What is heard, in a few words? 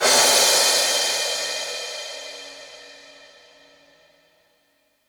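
CYM PLATE crash cymbal; quadraverb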